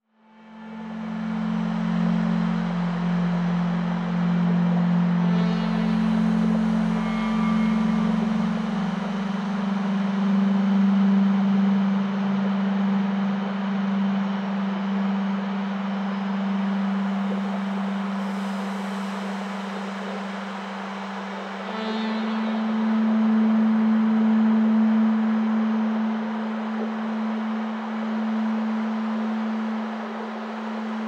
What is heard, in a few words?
Skysounds